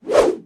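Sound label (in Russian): сабельный размашистый замах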